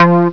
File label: bass